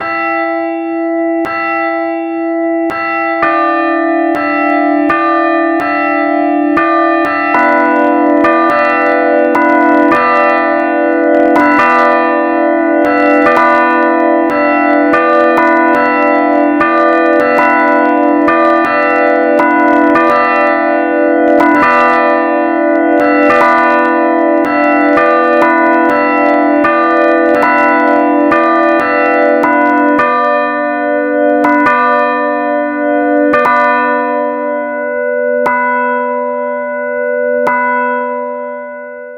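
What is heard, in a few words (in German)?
Tonaufnahme h1 d2 e2 Anschlag, mehrstimmige Geläute; 1963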